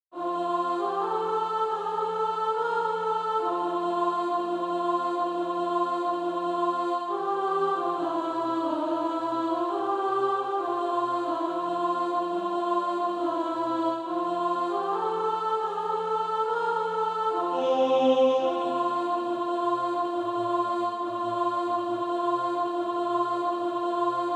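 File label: alto, SATB Practise Music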